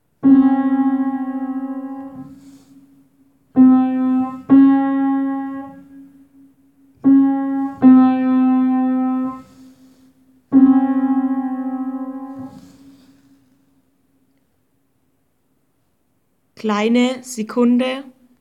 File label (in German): Kleine sekunde